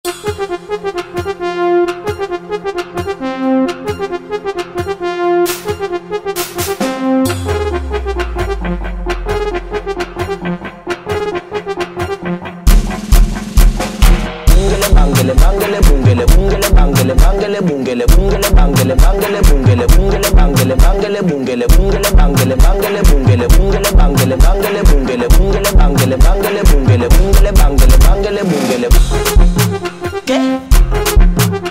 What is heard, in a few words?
Afro House